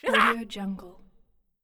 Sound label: goblin laughter